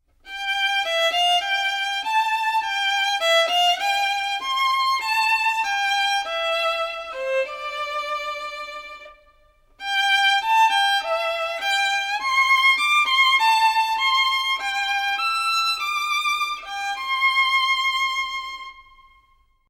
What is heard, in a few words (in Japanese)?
ヴァイオリン